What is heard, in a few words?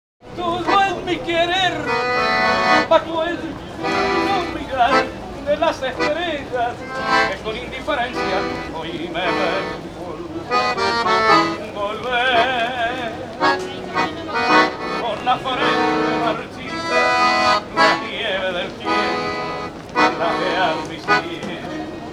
Salsa Picante; Masterbits; World Zone VOL-3